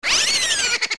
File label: Die; OOT; Poe Sister